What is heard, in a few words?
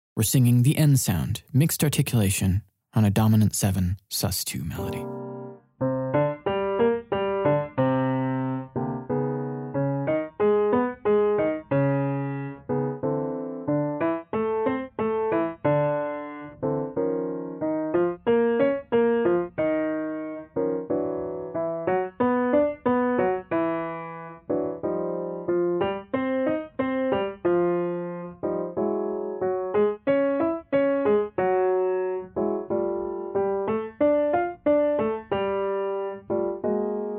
Vocal System N Sound Exercise Dom7sus2; V60